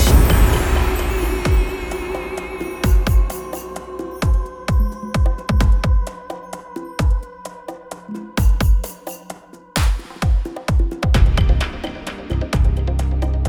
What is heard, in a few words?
Piano Love